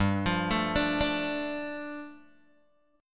G 7th Suspended 2nd G down Guitar Open G

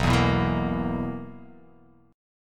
B Major 7th Suspended 4th B down

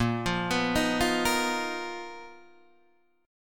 B Major 7th Flat 5th Bb, chord shape

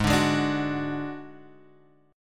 D-Major Flat 5th, Ab

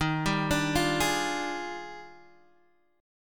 Eb Major 11th, Guitar, DADGAD

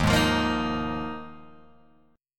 Eb Suspended 2nd Suspended 4th, Guitar, Open G

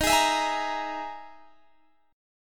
E-Major Sharp 11th D sharp

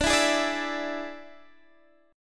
D Suspended 2nd Flat 5th D, Guitar, Open D

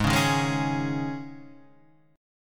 G sharp Suspended 4th G sharp, Guitar Standard; G#sus4 strummed; 4,4,1,1,2,4; JGuitar

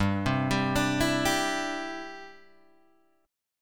C-Major Add 9th G, Guitar Half Step Down

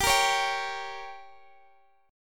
G Diminished G, Guitar Open D; x,22,20,19,22,x; down

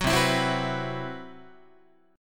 C Major 7th Flat 5th, Guitar, chord; CM7b5 strummed

